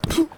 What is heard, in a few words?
Street Ball Hit Body; SFX Kit